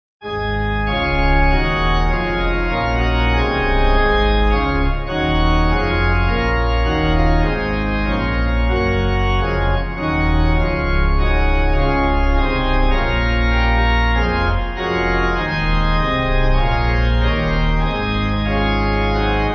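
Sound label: Pipe Organ, Music Backing Files for Churches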